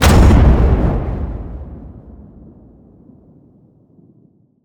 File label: HIT Fire, Cinematic Hits Designed